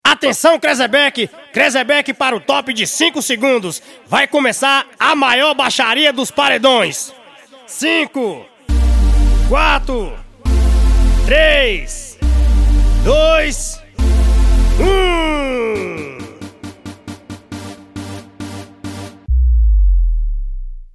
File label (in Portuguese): abertura